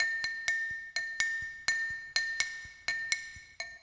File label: bottle, ethno, 125